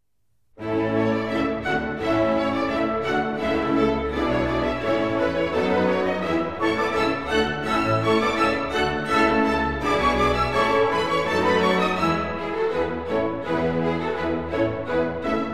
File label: organ transcription; Respighi, Ottorino; preludio; Bernardo Pasquini